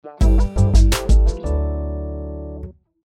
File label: Branch Construction Finished